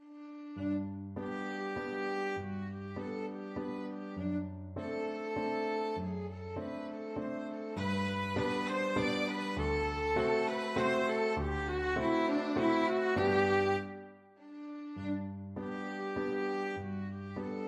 german dance violin; beethoven

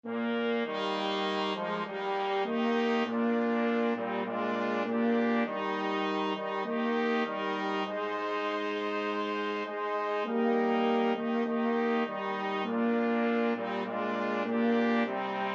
flexible mixed ensemble, 4 players

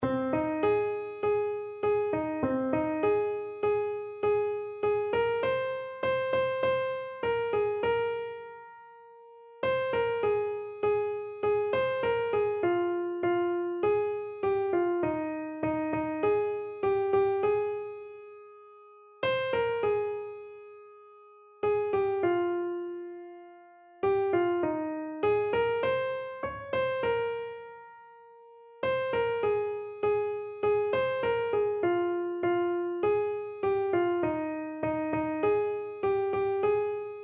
washed in the blood, keyboard

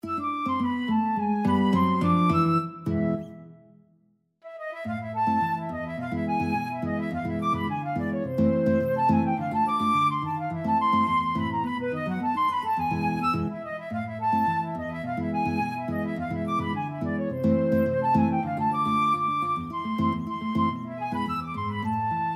guitar flute duet

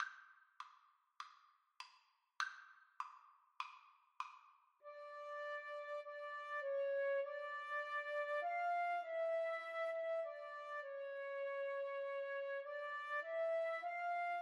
flute duet

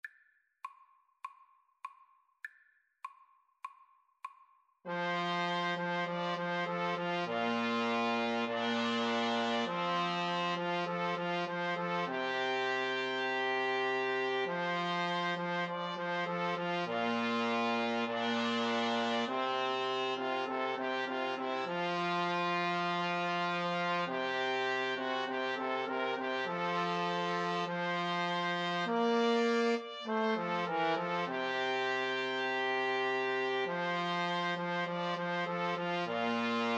2 Trumpets Trombone

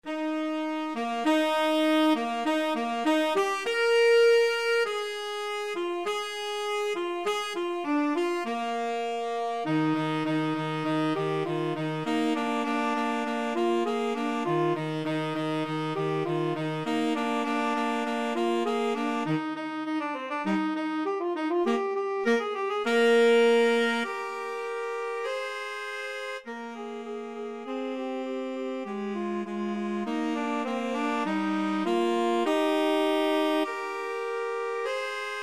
2 tenor sax; tenor sax duet

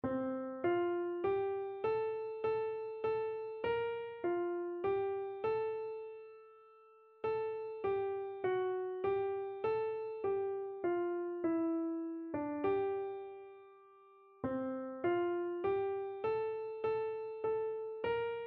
moment by moment, keyboard

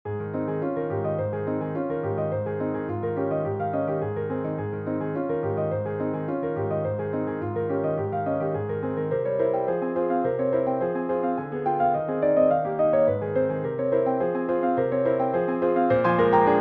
prelude in a flat major, piano

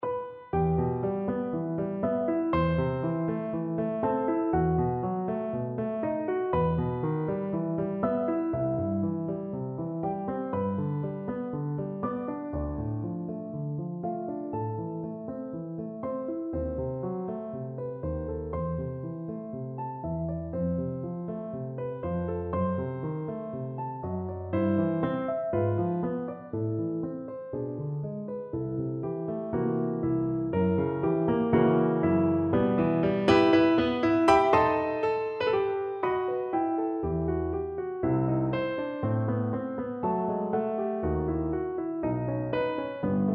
PNO

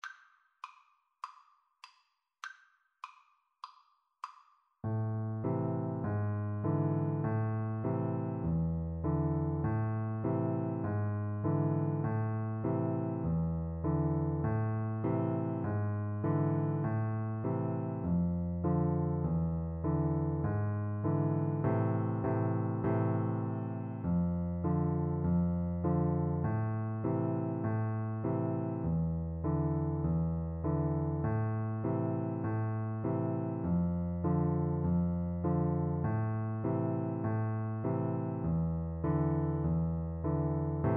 piano duet

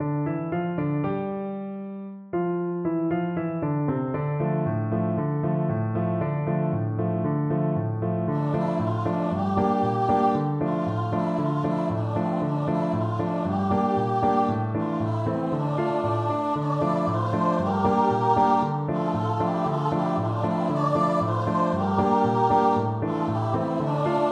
choir

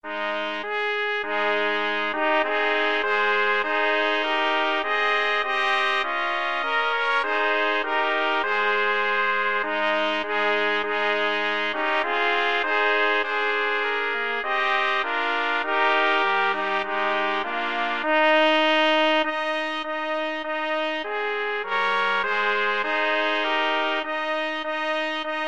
hark, trumpet trio

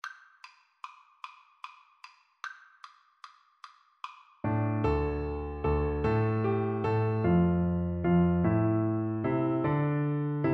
Bassoon

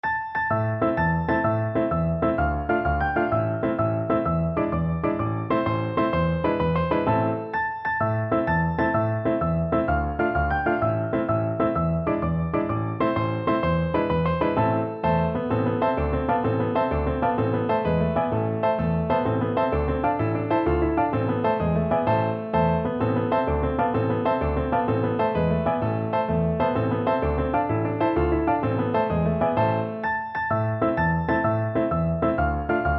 tarantella piano

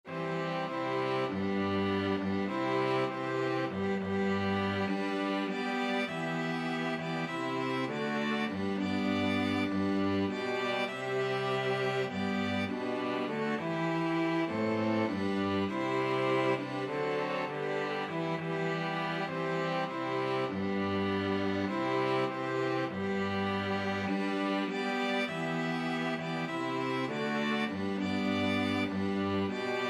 string quartet

